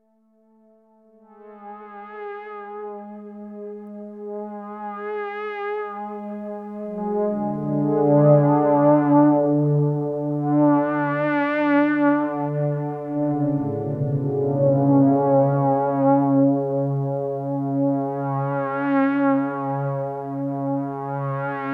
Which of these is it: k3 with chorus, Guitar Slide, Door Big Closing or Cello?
k3 with chorus